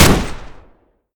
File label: mgun fire; deluge; weapons v3